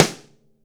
SN, Ensoniq ZR-76; 300 Drum Machines

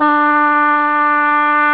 trump; totalrecall; ST-XX Amiga Soundtracker Sample Packs

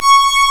C#, strings; Big Fish Audio

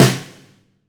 LUDWIG SNARE

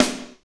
snare, R&B Snares, Roland

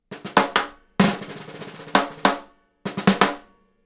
SNARE, Live Snares

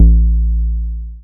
BAS SH-101; Best Service Gigapack 2

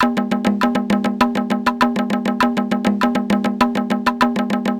Korg MR-16 Perc (100BPM); musicradar; cyborg beats

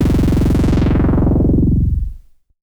MS20 Hammer Fall

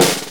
Snare, Snare Drum; Drum Tools

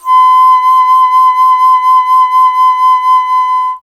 Alto Flute, Solo Instruments

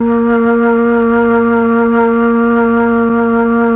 FLUT1; ILIO; Propeller Island Legendary M400

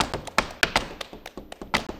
120 clap

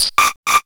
MORSE160, Hard & Loud Techno; USB Soundscan vol.01; AKAI

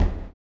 STOMPS; Spectrasonics; Vocal Planet; Blues Soul

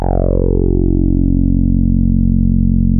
TAURUS A2, synthesizer; Hollywood Edge - Giorgio Moroder Rare Synthesizer Collection

